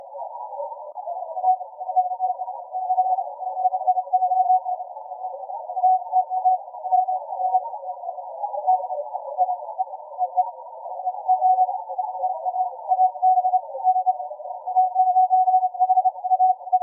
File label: 20m CW very weak signal